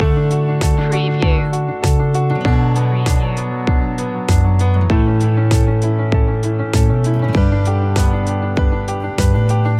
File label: dreamful loop